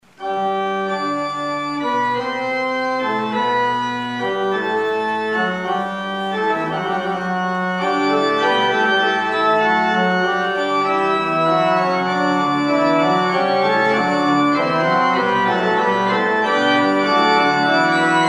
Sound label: Fugue G major